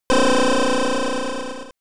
checkpoint; wario land

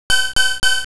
hurry; wario land

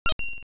Treasure Sheen; wario land

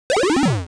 Power Down; wario land

